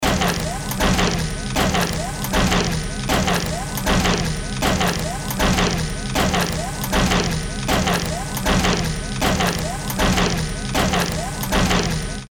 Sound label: industrial loop